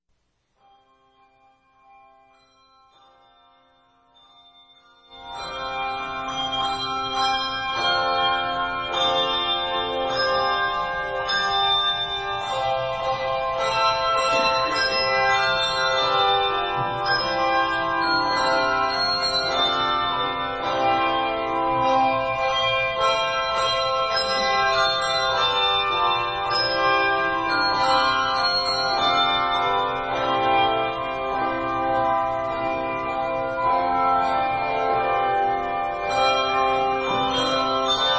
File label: bell choir